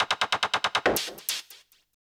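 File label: SNARE ELECTRO 140, Techno Drum; Techno2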